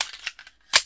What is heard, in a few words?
Gun Reload Weapon, Weapons Foley; SFX Kit